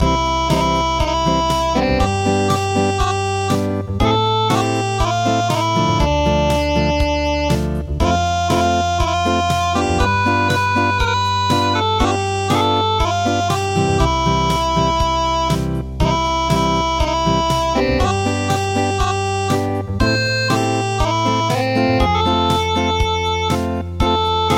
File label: Acoustic